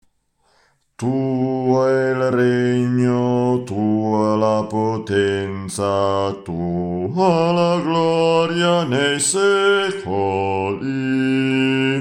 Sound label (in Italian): bassi; Tuo il regno